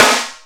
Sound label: Pic Snare, dry snares; Quasimidi Rave-o-lution 309